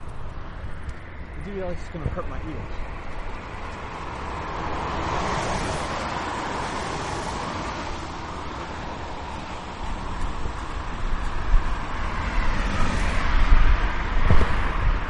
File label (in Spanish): el trafico